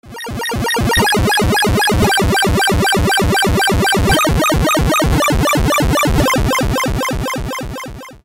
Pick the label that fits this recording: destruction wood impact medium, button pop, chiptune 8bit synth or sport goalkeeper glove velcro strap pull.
chiptune 8bit synth